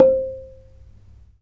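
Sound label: ranat thum C5; Spectrasonics - Heart of Asia CD2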